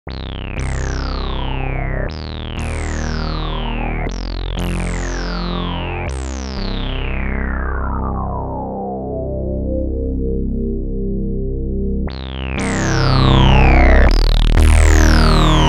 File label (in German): fett